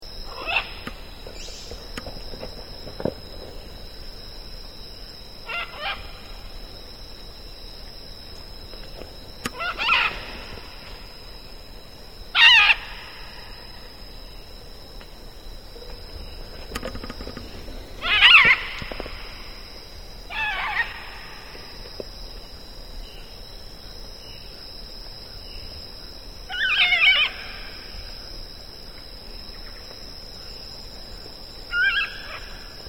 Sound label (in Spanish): guacarojo, Guacamayo Rojiamarillo, Ara macao, Scarlet Macaw; cantos